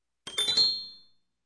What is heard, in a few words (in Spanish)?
pianito